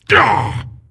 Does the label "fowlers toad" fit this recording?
No